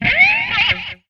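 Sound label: Music Guitar Pick Scrape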